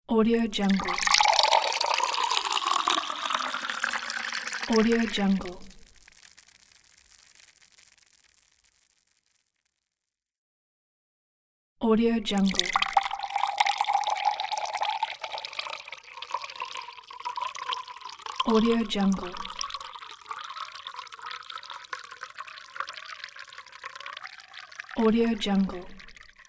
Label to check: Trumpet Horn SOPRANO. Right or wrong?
Wrong